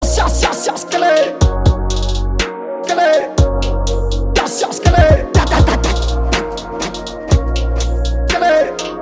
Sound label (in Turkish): coban trap